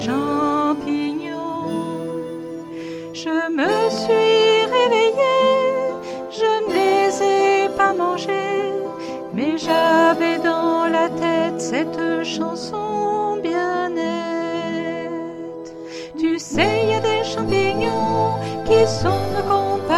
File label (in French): extrait chant